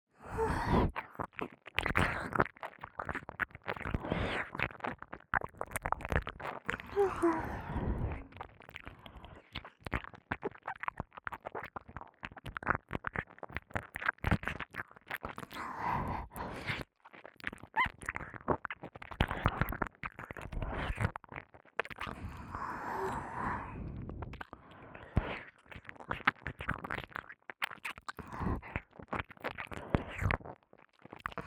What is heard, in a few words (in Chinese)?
(右)舔耳 滋噜滋噜; 舔舐声特化; 双耳立体声